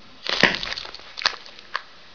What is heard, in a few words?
barnacle chew; hl2